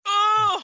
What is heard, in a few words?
uuu